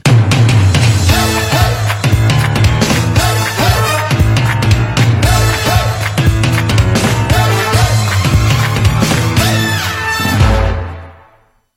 ringtone; modern family

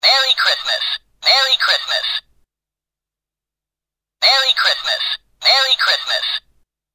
merry christmas ringtone

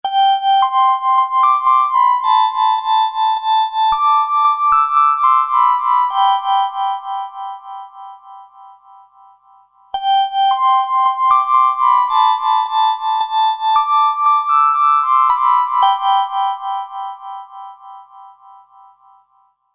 Xmas ringtone

